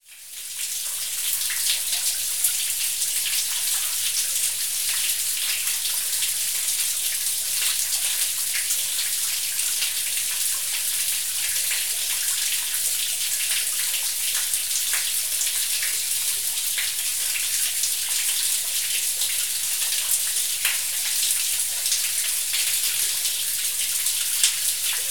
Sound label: nature water snowmelt pouring into storm drain